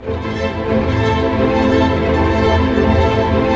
STR ARP MI, Symphony Orchestra, STR Arpegg. Minor; Roland LCDP08 Symphony Orchestra; STR Arpeggios

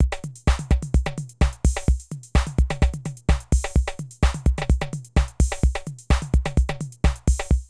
Drum loops, Euro techno; Zero-G Groove Construction (1993)

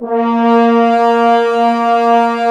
Brass F.Hrns A#; Miroslav Vitous - Woodwind Brass Ensemble

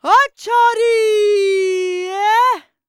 VOICE, EASTERN FX; ILIO; Vocal Planet

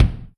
Kick, Yamaha RY20

